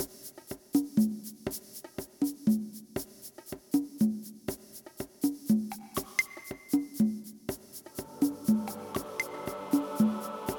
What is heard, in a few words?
dance leave rhythm